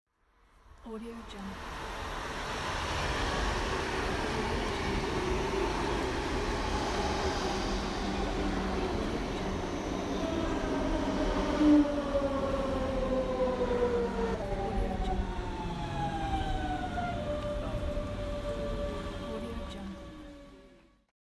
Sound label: train stop at rail station; cinemandre